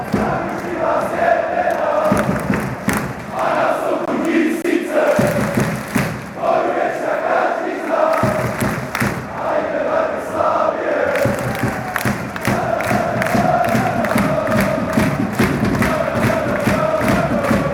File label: fanchants